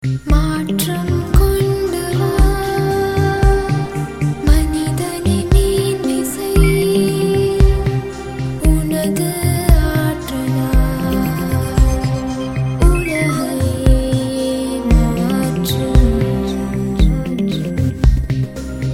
Romantic Classical

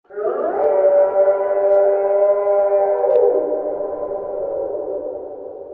roar; Wendigo

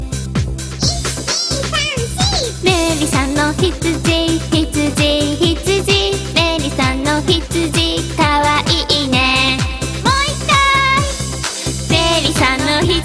Child Song In Japan; meloboom

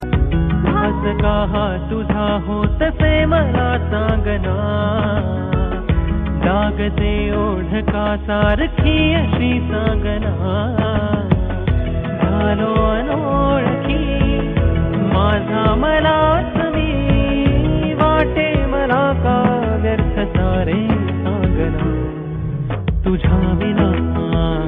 Male

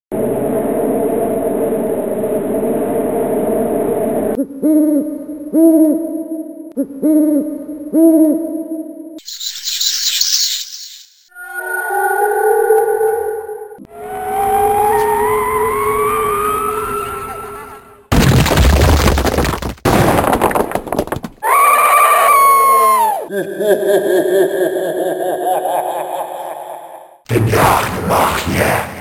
Horror

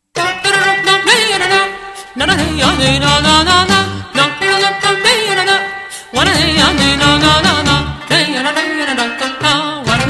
Zeybek

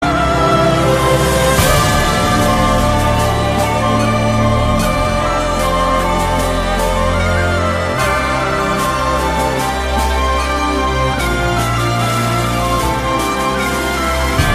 Ringtone; Fairy Tail

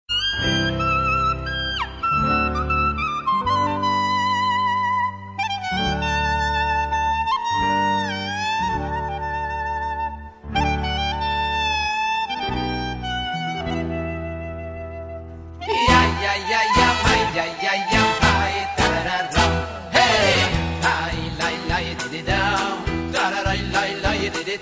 Nigun

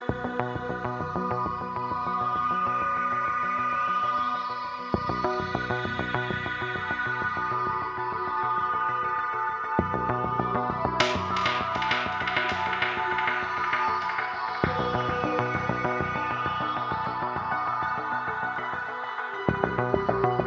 Mystical

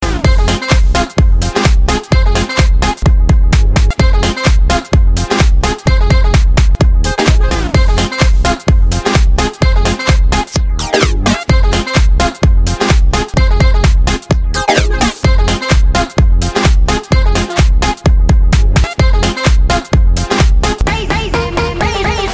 Saxo; Xmas

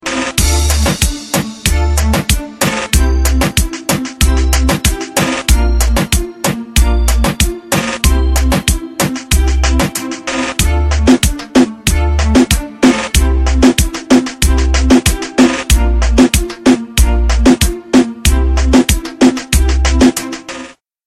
Reggaeton Beat

